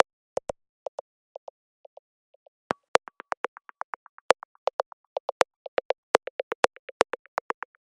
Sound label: 122 BPM Claves; mmb drum arsenal vol 1